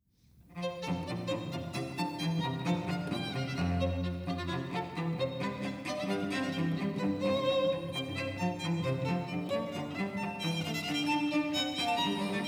Duet of Violin and Cello; G. F. Handel, Bourree from the The Watermusic Suite

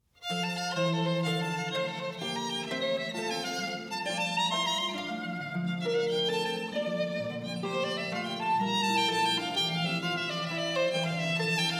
Duet of Harp and Violin; G. F. Handel. Giga from Sonata in F Major

